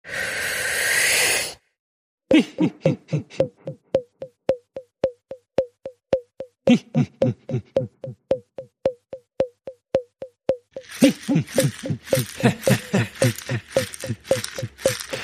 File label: No Bass